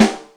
Buzz ring, Yamaha DTXpress Acoustic Snare

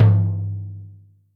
Jazz Flr, Acoustic Tom

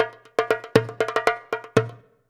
119 DJEMBE, Modern Percussion Loops; USB Soundscan vol.56; AKAI

